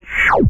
Hud Close

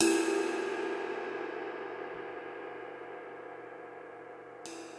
Ride Silent Cymbals Snares

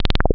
Boink

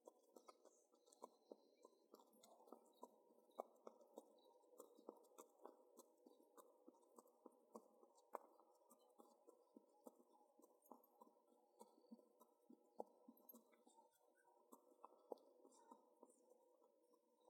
Horse On Dirt Road

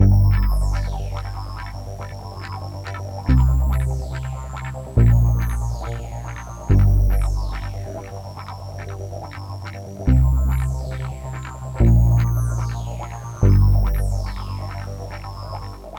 Retro Action Groove

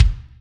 Stereo Processed Bass Kick Drum

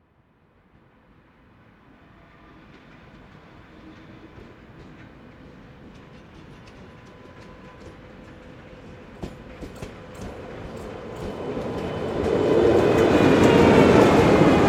Big Train Passing By

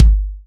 Dnb kick

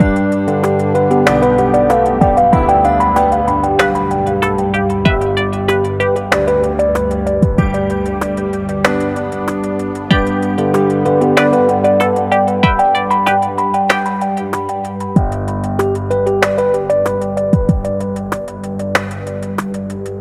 Soft Background Loop

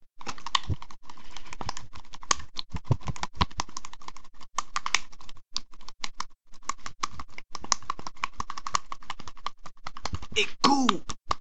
Fapping

